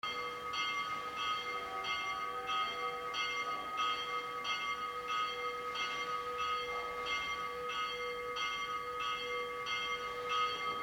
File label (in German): Glocke; dom st martin rottenburg